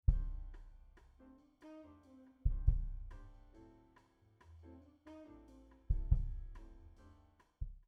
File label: Kick Raw